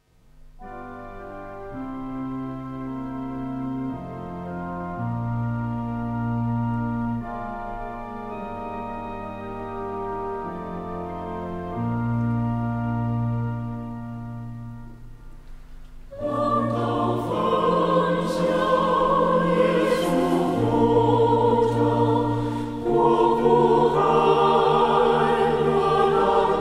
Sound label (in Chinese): 耶稣呼召歌